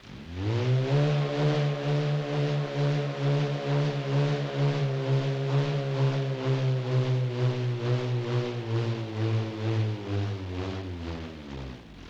LoFi Motor Whir Down; Vintage Cartoon; Foley

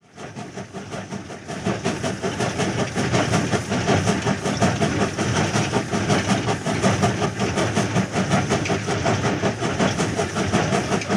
Steam Train Constant; Period Vehicles

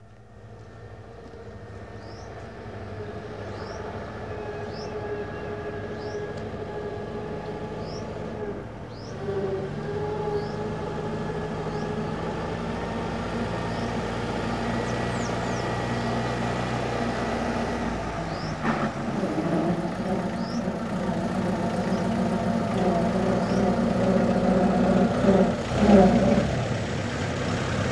Hupmobile In Idle Off, Period Vehicles

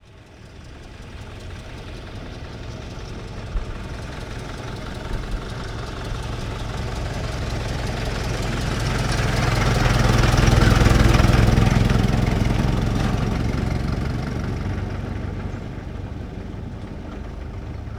1924 Ford Stake Bed Truck Ext Slow By; Period Vehicles

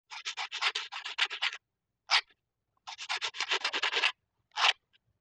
Pen Writing; Vintage Cartoon; Foley

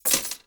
Keys Drop; Vehicles; Doors & Keys

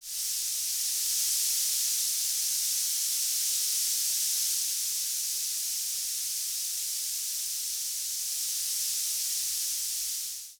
Steam Train Release Steam; Period Vehicles

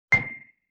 Xylophone Single Note C Oct; Vintage Cartoon; Musical Elements

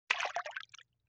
Water Splash Small; Vintage Cartoon; Foley

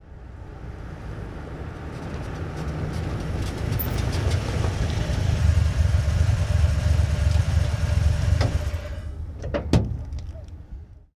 1947 Ford V8 Truck Ext In Medium Idle Off; Period Vehicles

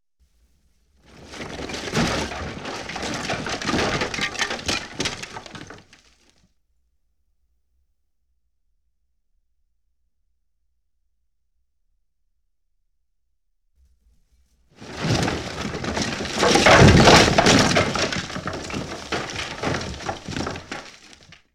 Explosion

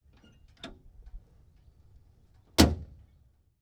1924 Ford Stake Bed Truck Door Open Close; Period Vehicles

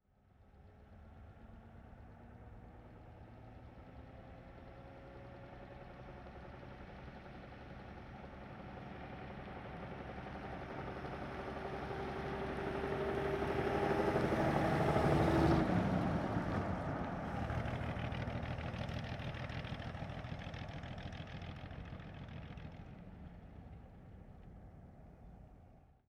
1937 Plymouth Ext Medium By & Shifts; Period Vehicles

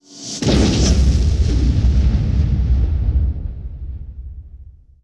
Explosion With Reverse Intro; Explosions

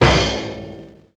Bass Drum Cymbal Hit

